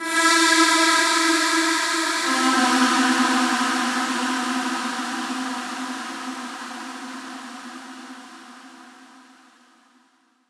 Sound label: synth Emin; bowser